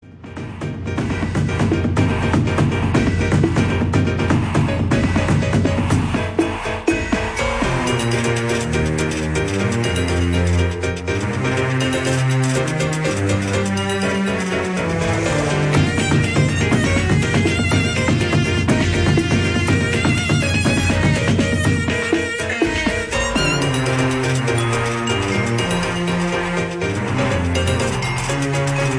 Piano Children

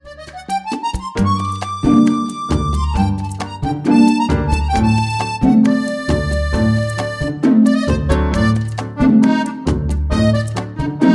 Free Instrumental Ringtone

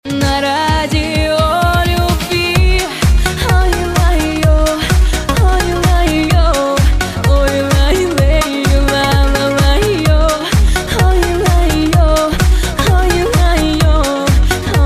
Flute Ringtones; Samsung

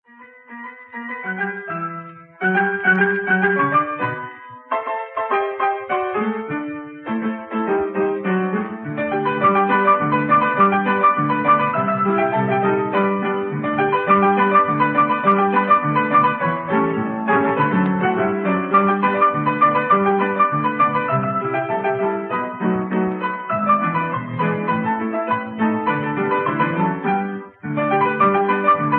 Piano Original Ringtone

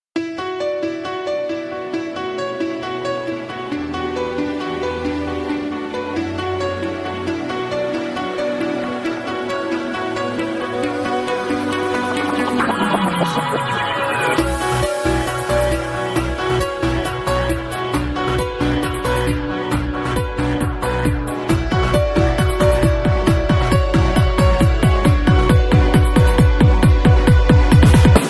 Piano Flute Ringtone

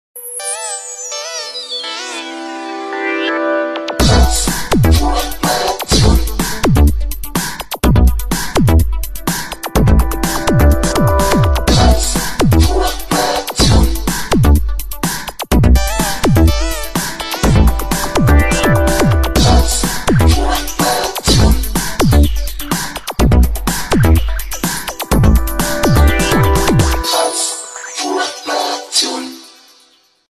Nokia Ringtone

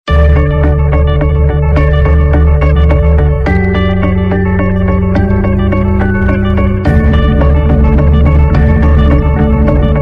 Apple Iphone Ringtone

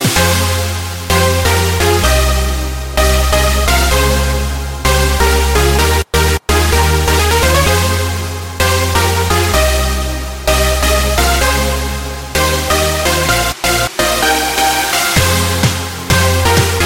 Ringtones; Samsung

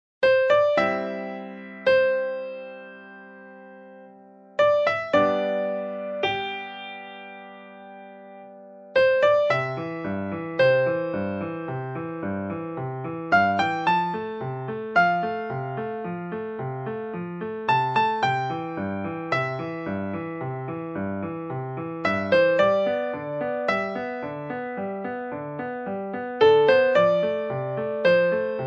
Piano Ringtone

